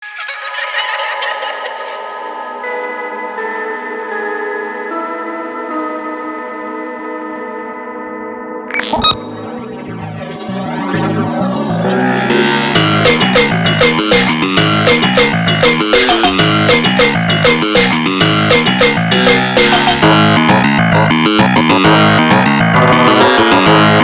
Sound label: brazilian phonk